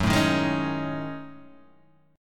F sharp Minor 6th Add 9th, Guitar, Open G

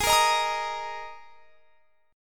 Ab Suspended 2nd; strummed; Absus2; x,23,20,20,23,23

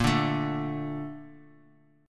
A sharp Major 7th; A#M7; strummed; JGuitar

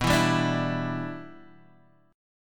B Suspended 4th Sharp 5th Add Sharp 9th B; Bsus4#5add#9 strummed; JGuitar

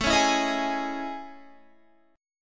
A Major Sharp 11th, Guitar; AM#11 strummed

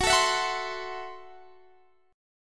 F sharp Minor 7th Flat 5th

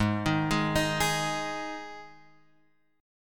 G sharp 5th G sharp Guitar Drop D

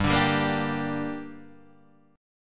A sharp 6th, chord

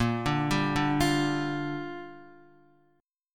Bb 6th, Guitar; Bb6 arpeggiated

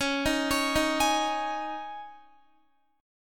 Db Suspended 2nd Guitar 4F,4A,5C,5G,5C,6E; arpeggiated; x,16,15,18,15,16

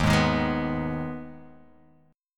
Eb Suspended 4th Sharp 5th; Ebsus4#5 strummed; x,6,6,8,8,6,x,x; JGuitar

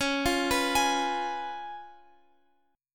C sharp 7th C sharp, Guitar Open F; C#7; arpeggiated; tuning=Open F; guitar chord

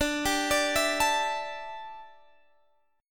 D Suspended 2nd Suspended 4th

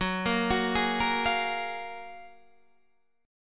Gb Major 9th, down, Guitar, DADGAD

